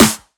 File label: sharp acoustic snare A key